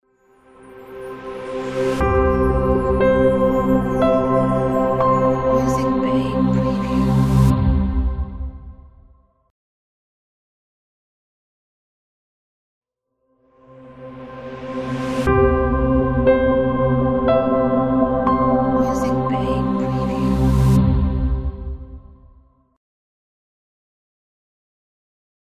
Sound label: Minimalistic Ambient Logo